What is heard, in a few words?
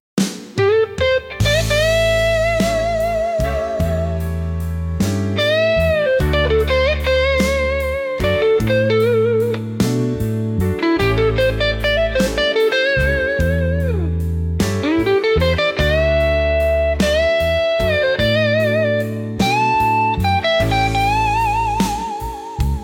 rock blues, instrumental